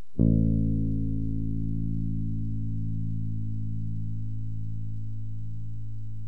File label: guitar A1